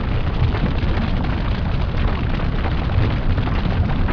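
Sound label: ambience lava flow loop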